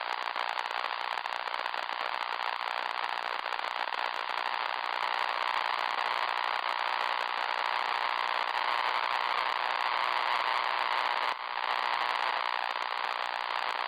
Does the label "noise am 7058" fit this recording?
Yes